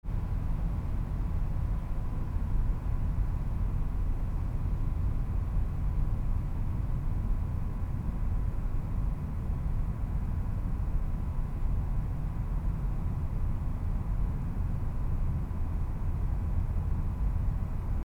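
Ambience design Server Room Data Center Machine And Vent Noise Wide Designed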